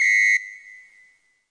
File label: police whistle; Western and Latin Percussion; single hits